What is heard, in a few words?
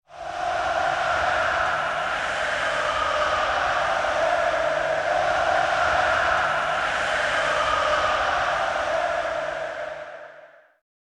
Crowd Fan's Song Cheer - Interior Stadium Very Large Crowd Soccer or Music; Hollywood Edge; sound designer's toolkit v2